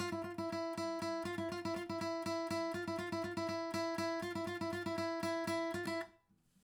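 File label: acoustic pattern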